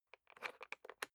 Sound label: briefcase plastic latch lock key turn gentle; industrie wirtschaft; bueroarbeit